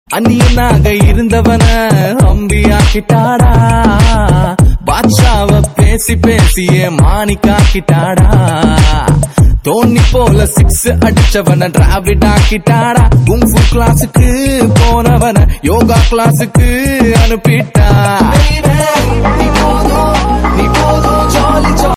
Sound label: tamil ringtone